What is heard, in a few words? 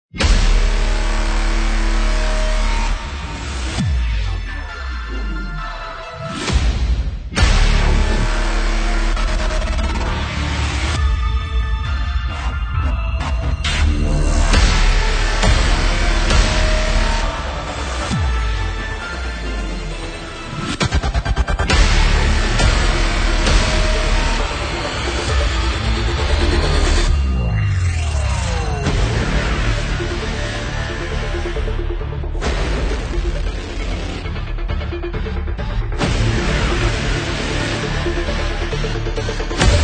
Epic Main